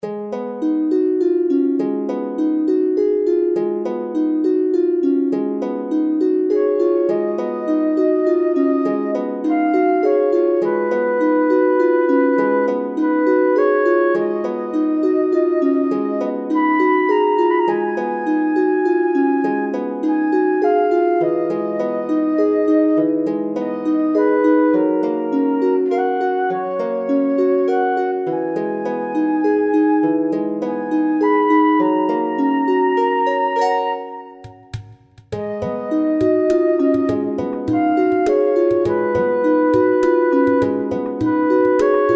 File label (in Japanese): せせらぎの森